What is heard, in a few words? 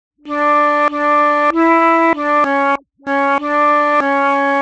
original flute